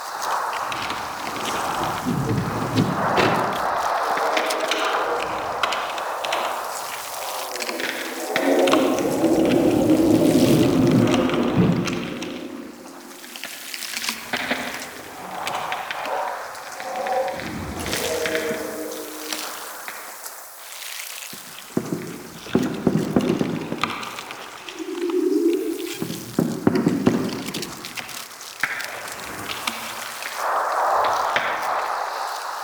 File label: Exterior Valley Loop, Ambience Infested; Warframe; Infested Eris